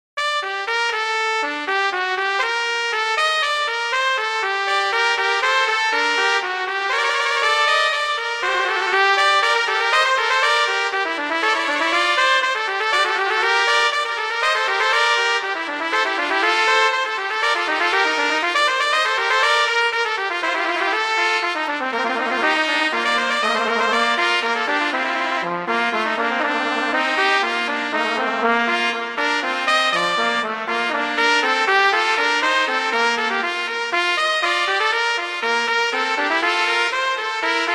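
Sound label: Vivace moderato, violin viola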